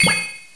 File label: gain point; jailbreak